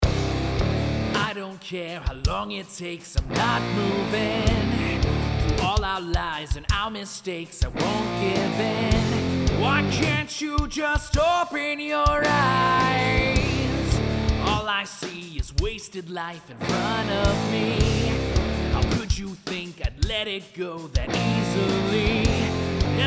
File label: Track Antelope 67, virtual mic